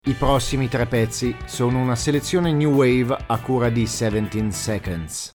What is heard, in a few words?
Jingle